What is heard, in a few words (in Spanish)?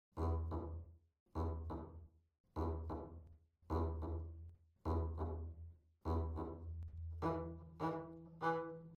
contrabajo